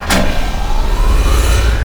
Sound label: Machine Powerup